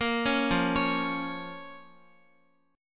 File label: F Suspended 4th Sharp 5th F, Guitar; Fsus4#5; arpeggiated; x,x,8,6,5,8